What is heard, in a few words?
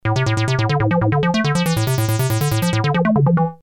techno